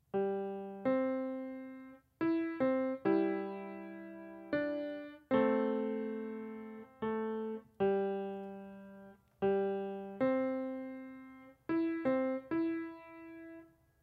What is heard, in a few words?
Instrumental